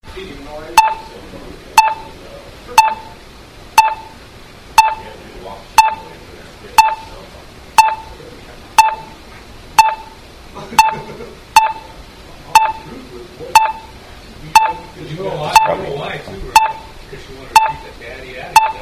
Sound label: aps tone